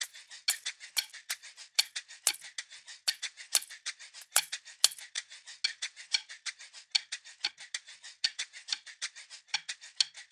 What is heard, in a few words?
Denghu Perc Loop - 186 BPM; Cymatics; Fiji Hihat Loops & Percussion